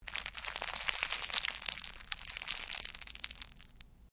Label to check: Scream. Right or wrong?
Wrong